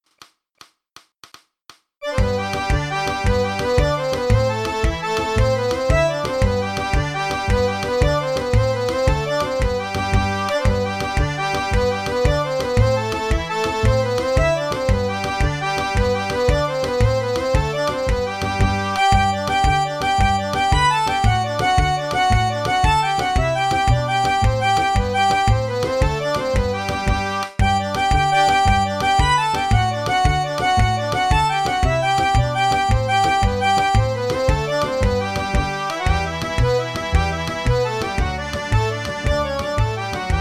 Traditional Irish Jigs D

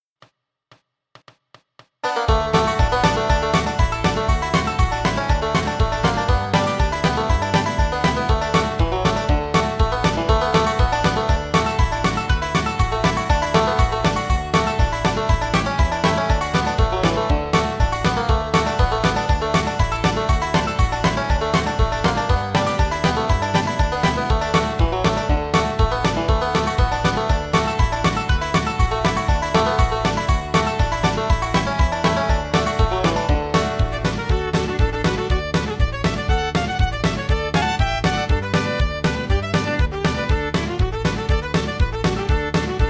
Bluegrass Banjo fiddle D

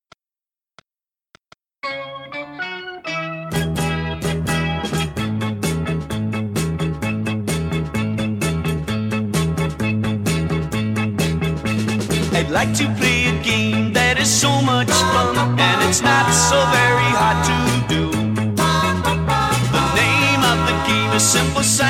Party Song, mp3 backing track

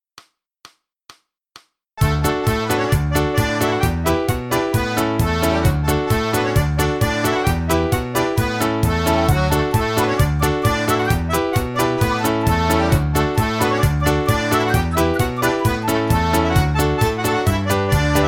Traditional Irish Jigs D

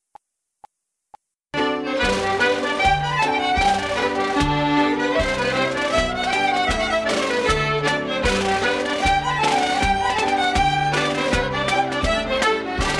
Traditional Irish Hornpipes Medley G

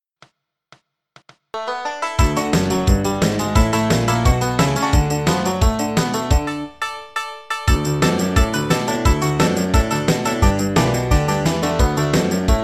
Bluegrass, D, backing track

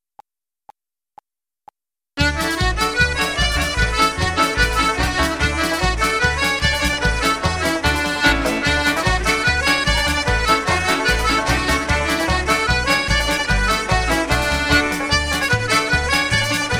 Traditional Irish Fast Polka D

